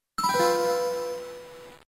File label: Something Strange